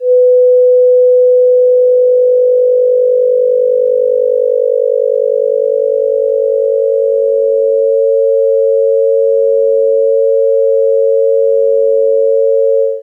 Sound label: Amplitude Modulation